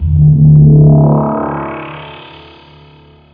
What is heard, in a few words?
Synth Rise; SpecOps2MPlayerDemo; Music